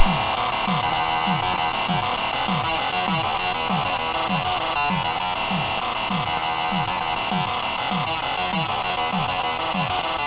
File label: Reactor High